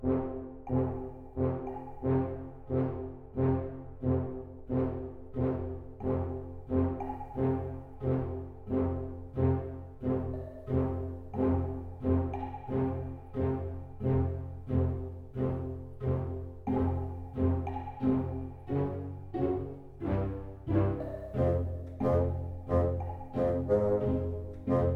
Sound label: Mines Of Avarice Ambience; Tiny Tina's Assault On Dragon Keep